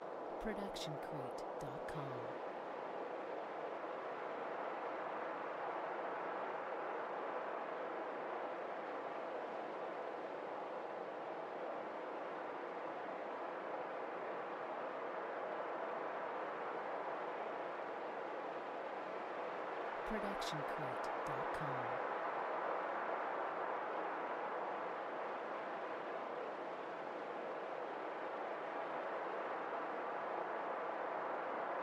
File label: wind noisy; soundscrate